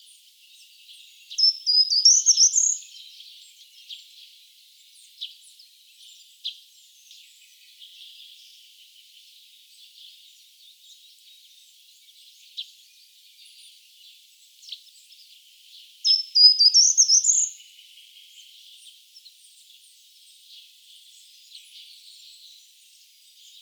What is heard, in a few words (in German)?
gartenbaumlaeufer gesang; vogelstimmen; nabu; lars lachmann